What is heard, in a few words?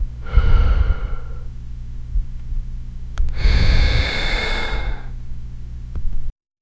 slow breathing